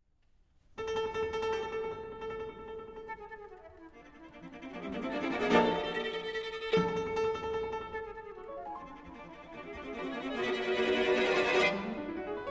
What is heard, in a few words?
toccata quintette; toccata2000